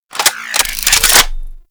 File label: Polearm Equipped, Weapons